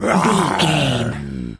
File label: tas devil cartoon